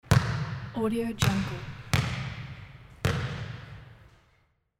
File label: basketball bouncing in large gym